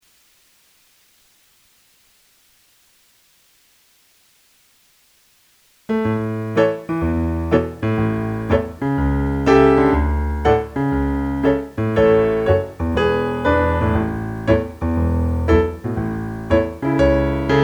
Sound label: Piano with basslines; Donald MacLean's Farewell to Oban